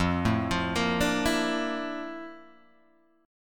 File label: Bb Major Sharp 11th F, chord